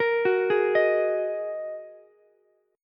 Ab 7th Suspended 2nd down Ukulele; Ab7sus2; arpeggiated; chordshape; JGuitar

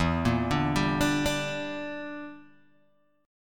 E Minor 7th Flat 5th E, Guitar Open G

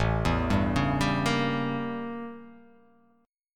A 7th Flat 9th; A7b9 arpeggiated; JGuitar

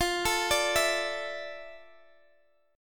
A Suspended 4th F, chord; A4/F arpeggiated

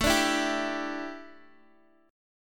A 7th Suspended 4th Guitar Open G, chord; x,x,7,7,5,5

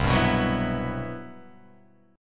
C Major Sharp 11th; CM#11 strummed; JGuitar

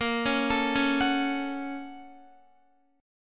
Bb Minor Major 7th Sharp 5th, Guitar; BbmM7#5; arpeggiated; x,13,13,14,13,14; JGuitar

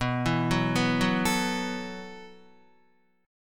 B 7th Suspended 4th B down Guitar; B7sus4; arpeggiated

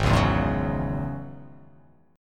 G sharp 7th Suspended 4th Sharp 5th G sharp; G#7sus4#5 strummed; 4,4,2,1,1,1,x,x; JGuitar

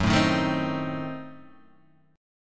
Gb Diminished 7th Guitar Open G

